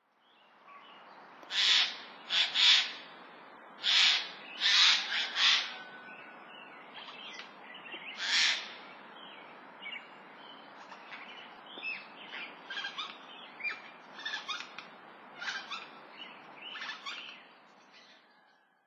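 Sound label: kakesu, Eurasian Jay; utsunomiya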